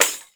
pandeiro; E-MU Producer Series Vol. 7 – Old World Instruments

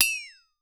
FLEX-A-TO, FLEX-A-TONE; Voice Crystal - Steve Reid Definitive Percussion

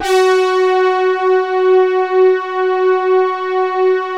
OBERH, PADS; Vintage Voltage

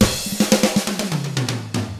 ROCK

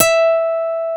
JV80 NYL E6, GUITAR; East West - Global Sounds; JV8GUITAR

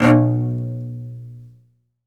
SOLO CE, Strings; ILIO

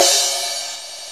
CYMBAL, DrumBase5000; Crypton; CFZ KIT 2

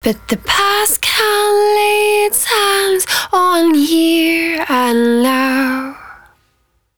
110bpm Ab, Velvet Vocal; Zero-G

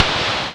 noise fx; Masterbits; Switch FX

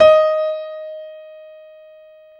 PIA MICRO, MICRO-PIANO, Roland

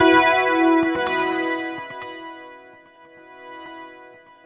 GLIMMERS, STRATICUS; Spectrasonic Distorted Reality 1

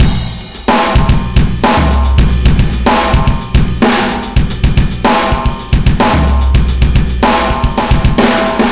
beat boy 110bpm

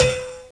Buzz Xylophn; Roland LCDP11 Africa VOL-1; Bala & Xylo

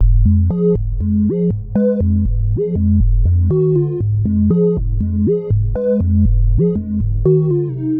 Lead

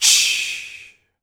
FEM PERC, Vocal Jazz FX

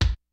kicks snare, kid; Kid Nepro; Elite; KICKS SNARES

